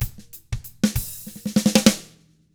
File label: 95 funk end, drums; ILIO; Double Platinum Drums 1